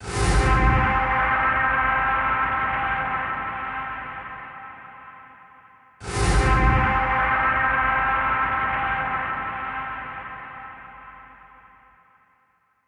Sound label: suspense; DayZ-Epoch; dayz sfx